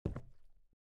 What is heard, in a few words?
step wood